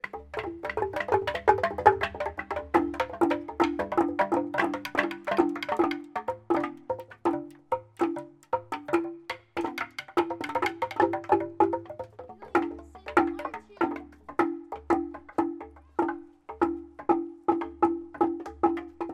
musical large xylophone forest children play chat scotland